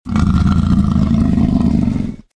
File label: Velociraptor - Growl; RadwinIsland